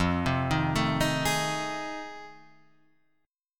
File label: F 7th Sharp 9th, Guitar, Half Step Down; F7#9